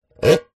tin can scrape ribbed edge; foley

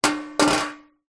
household grater metal fall over

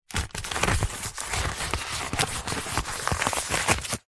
cardboard piece small handling movement crumple

